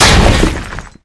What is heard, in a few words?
charge hit world; tf